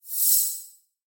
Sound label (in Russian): zvuki volshebnoi fei